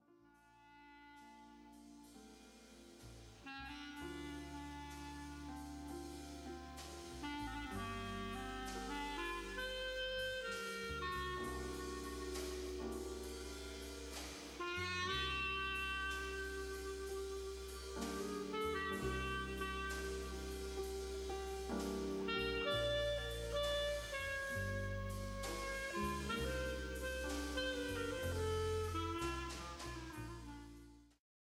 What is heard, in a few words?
slow jazz